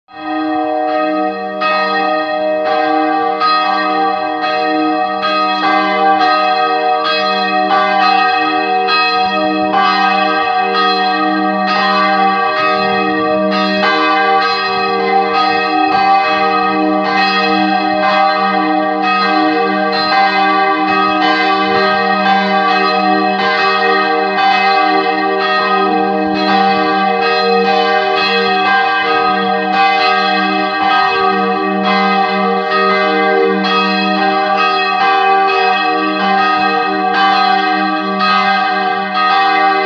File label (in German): Glocken; Voigdehagen; 2 Glocken